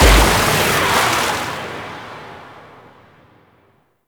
Pirate Cannon Blast; Warframe

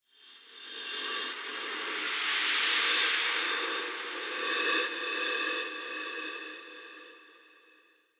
Spirit Fly, Ambience; Soul Plane; Orlick

